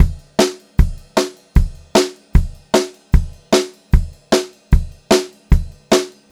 152 SPEED RIDE, Pop-Rock Drumloops; USB Soundscan vol.37; AKAI; 90 s Sample CDs

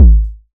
909 BD Tech; Goldbaby Tape808 & Tape909; The Tape 909

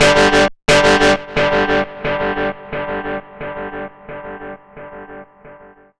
FX DIST; FX DISTO

